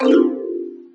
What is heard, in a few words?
dialog appear; uisounds; There; ThereClient